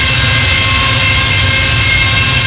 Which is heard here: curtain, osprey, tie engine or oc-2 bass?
tie engine